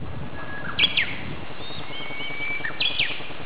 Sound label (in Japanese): uguisu